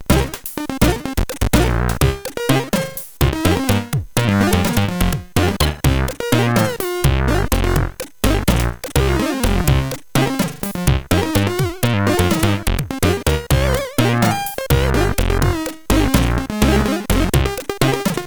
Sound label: Just Walk Along With Jazz, CSG 8580